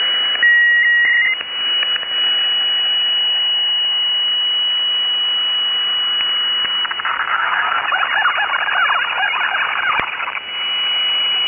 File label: LINCOMPEX DSP 9000; LINCOMPEX circuit with scrambled voice using TCC DSP 9000 scrambler; voice encryption, vocoder & voice compressor